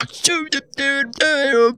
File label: Funky Vocals; USB Soundscan vol.18; AKAI